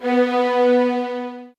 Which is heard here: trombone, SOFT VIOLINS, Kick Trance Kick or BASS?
SOFT VIOLINS